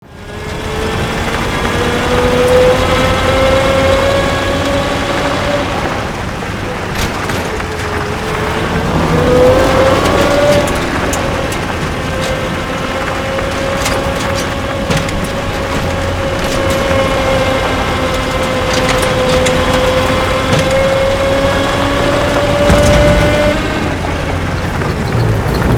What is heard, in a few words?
Truck